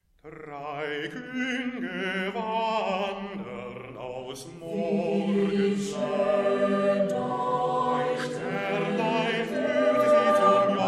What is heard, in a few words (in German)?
solo und chor